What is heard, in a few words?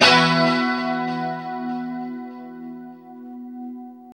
2CHD E 4 -R, Guitars; Spectrasonics; Hans Zimmer Guitars VOL-1; JC-SHEEN